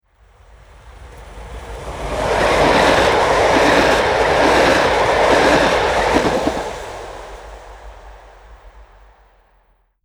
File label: Train passing